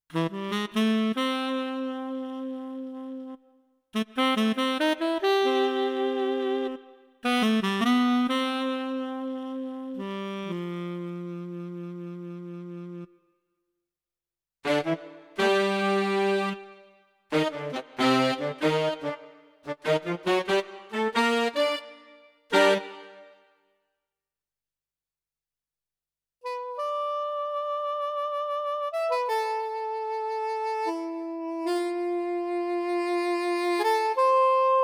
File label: Brass Woodwind